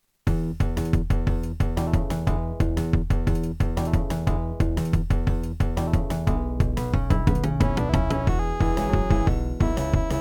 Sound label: blues; internet bad boys; soundtracks; neighborhood conflict